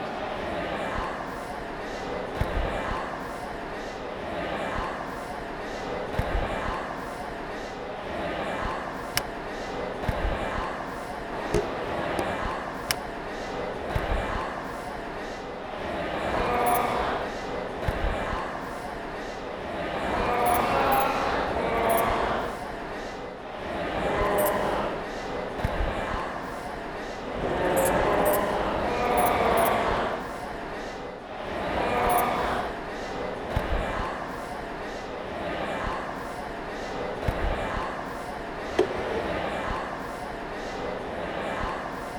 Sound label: chatter opera